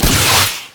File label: heat seeking; LoL; battlecasturgot